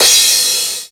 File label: ambient crash cymbal sample D key, Royality free crash cymbal drum sample tuned to the D note.